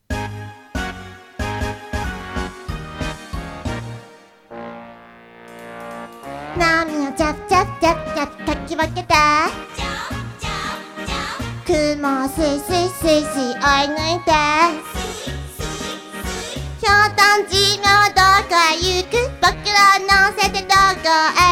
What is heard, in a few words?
karaoke